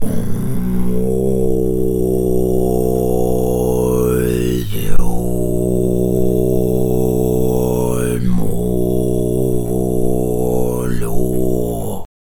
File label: Low Chant Dry